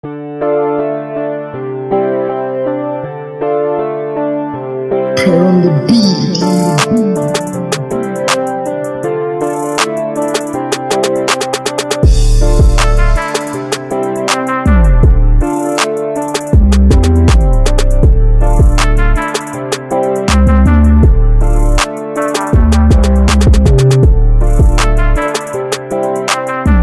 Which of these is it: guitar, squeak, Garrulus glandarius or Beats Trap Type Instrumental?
Beats Trap Type Instrumental